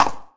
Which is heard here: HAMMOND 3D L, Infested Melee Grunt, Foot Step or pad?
Foot Step